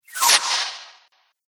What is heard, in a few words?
Magical Air